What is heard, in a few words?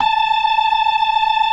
FAST VIB, TREMELO+VIB, Synth and Organ